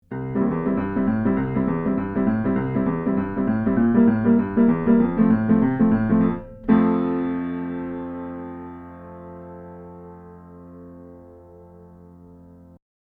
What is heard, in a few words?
Blumlein Piano; Nohype LRM-V; MixPre6